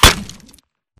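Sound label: Bullet Light Ballistic Concrete impact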